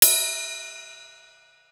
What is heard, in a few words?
Cymbal, Drum One Shots; AMG - Coldcut's Kleptomania VOL-1